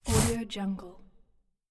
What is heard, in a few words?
old sailboat hemp rope slide